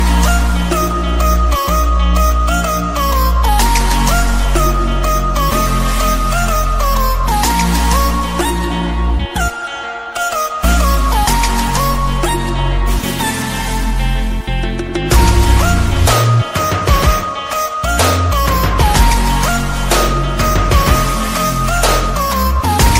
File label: cool english music ringtone